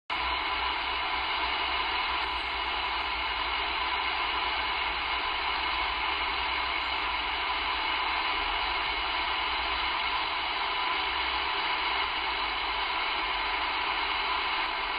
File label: 4.85MHz